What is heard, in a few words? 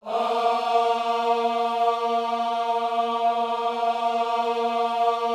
OHS A#3, Gospel; Spectrasonics; Vocal Planet Vol.1 Gospel; akai